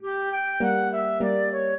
minuet, flute harp; Mozart

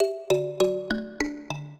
trio, mbira; Mozart

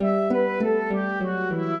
minuet, flute harp; Mozart

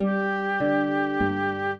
trio, flute harp; Mozart

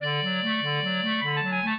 clarinet; Mozart

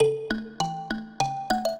trio, mbira; Mozart

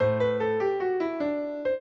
piano; Mozart

